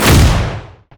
fracture; LoL; skarner

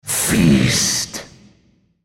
spellcast effort; Yorick; en US

